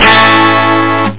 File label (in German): dur, guitar; da capo vol1